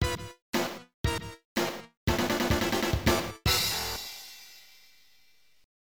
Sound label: Failed; sonic blast man; taito b system; arcade